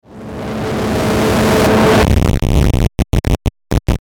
Distortion Ascend To Static; Ascending; Blastwave

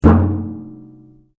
RUBBER ON METAL HOLLOW

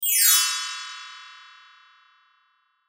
Magical Bells, Magical Bells Wipe; Miscellaneous Musical; Blastwave

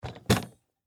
Animal, Cage, Set, Down; Extermination Sound Effects; Insects

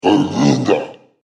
Alien Ogre; Alien Sound Effects

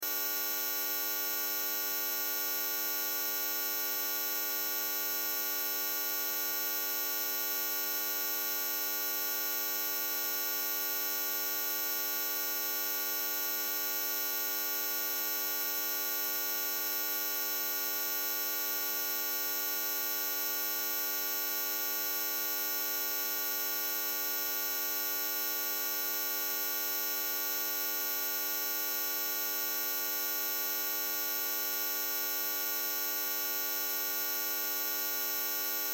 Sci Fi Buzz Hum Ballast Electrical Loop; Tech; Blastwave

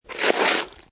Snow And Ice Ice Scrape Moderate; Sound Effects:Weather

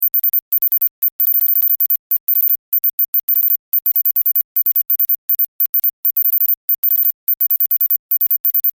Science Fiction Teletype Readout Beeps High Pitch; Communications

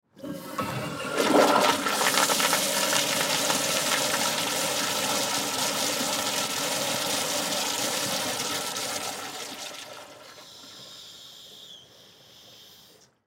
Toilet Flush, Toilet Flush Public Bathroom; Bathroom (Sound Effects:Household); Blastwave

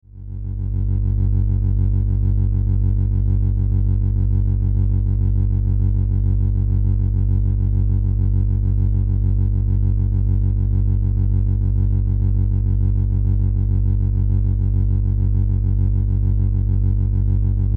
Science Fiction Electrical Hum Drone; Drones; Sound Design

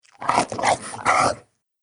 Troll Eating, monster; horror

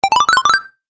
Health Booster, video game

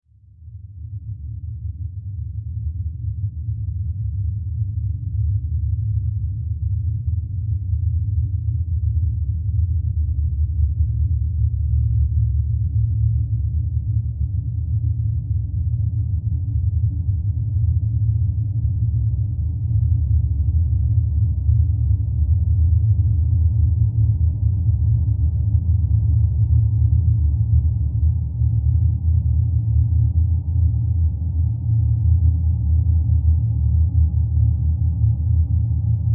Horror Drone Low Growl Moan; Evil

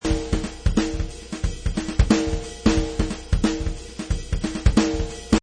Jazz drum loop at 180 bpm.; Jazz Jammin' Drum Beat Loop; Drum Loops

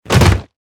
Sports Football Tackle Impact; SFX Bible 6

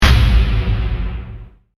Laser Cannon Fire

